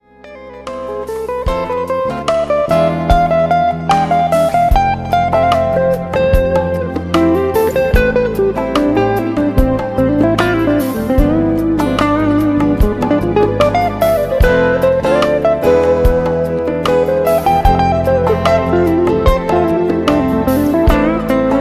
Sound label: timeless, scales